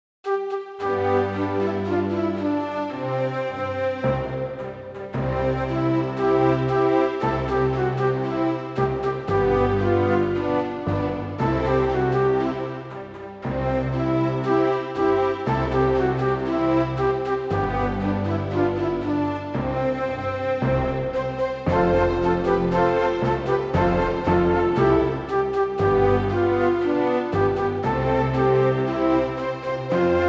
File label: school song instrumental; plett primary school